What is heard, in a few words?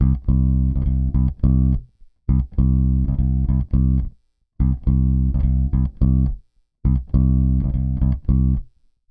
Rock, Bass; Bass X